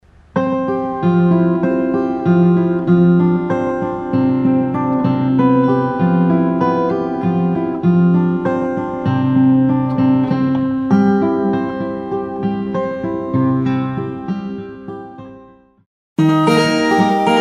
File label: 12 string, guitar